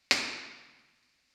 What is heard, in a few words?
IR MLS BIN